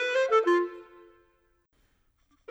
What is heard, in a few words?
Clarinet; Sonic Foundry (Sony Creative Software); Orchestral VOL-2; Modern 29